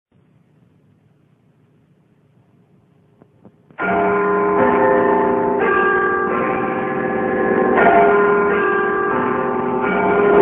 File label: bigben